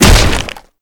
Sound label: hit; chriswalker; slender